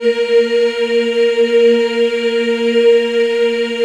A#3, Choir; USB Soundscan vol.28; Acoustic & Synth; AKAI

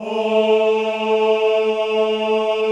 CHO A2, CHO Ooh; Sound & Vision; Gigapack I CD 2

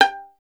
STR VLN, Solo Instruments; Miroslav Vitous

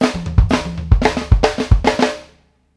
STD PIANO; Sampleheads - New York City Drumworks VOL-1

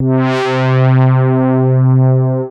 C3, BASS; Q Up Arts; Dream Experience; BASS BANK 13

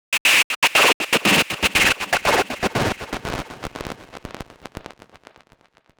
Gated Noise 120BPM; musicradar

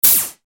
LFO NOISE, Yamaha RY-30

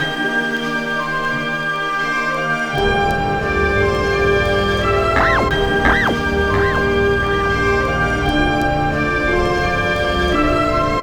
Melody, Hip-Hop

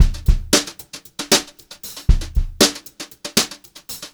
beat, Brushed Drum Loops; USB Soundscan vol.39; AKAI; 90 s Sample CDs; 116 HOTROD

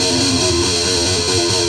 Riff, Industrial; Nasty

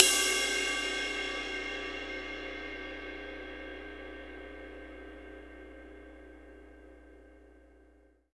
RIDE, percussion reverb; MARKYDRUMS

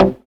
Synths Root C

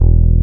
Fretless E0; Proteus 2000; Digital Sound Factory Proteus 2000

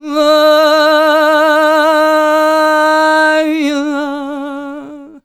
MEH1 VOX, Balkanish Traditions

